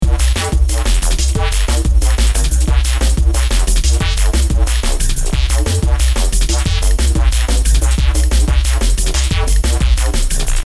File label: dnb loop